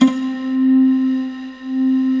SYN GLASS; Sound & Vision - Gigapack I CD 2

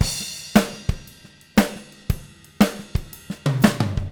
Living Drums; Sampleheads; Peter Erskine

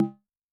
Ana Mid, Yamaha DTXpress-II Tom

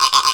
Wood, Percussive FX; musicradar; cartoon caper

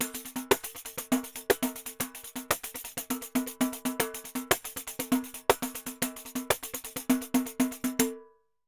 Pandeiro Samba 120 bpm; Best Service; Festa Latina VOL-1

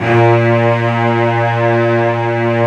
cl A#1, Cello Section, AKAI S-1000; Retro Sampler

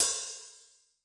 Open, HiHat, Yamaha DTXpress-II

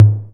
Lo Tom; EMU Xtreme-Lead-1

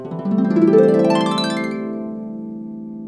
gliss up, harp; InVision Interactive; Lightware VOL-1; Instruments & Percussions

